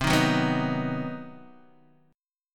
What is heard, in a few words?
C Minor Major 7th Flat 5th chord, Guitar Open D